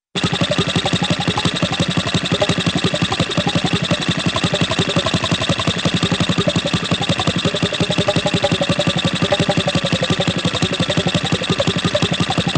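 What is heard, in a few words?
Funny Engine Steady with hollow Clops, cartoon; Hollywood Edge; Cartoon Trax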